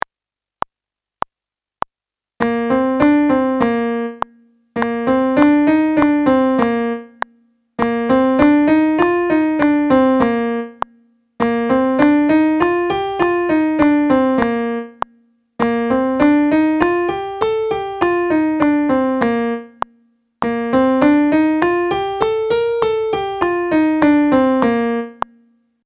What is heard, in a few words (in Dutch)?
Toonladder A# oefening piano